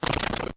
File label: destroy block